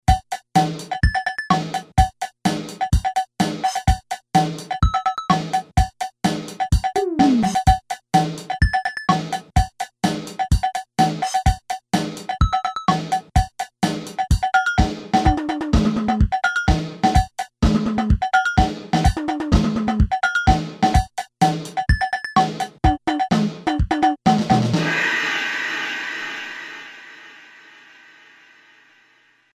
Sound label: Disco, Casio CSM-1, Rhythm Patterns